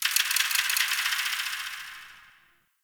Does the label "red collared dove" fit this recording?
No